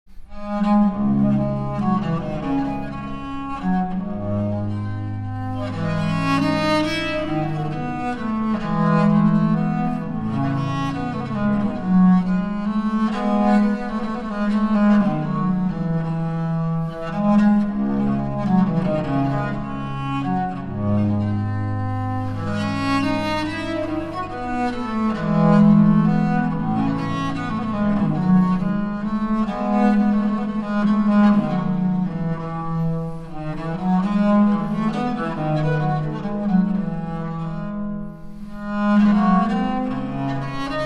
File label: courante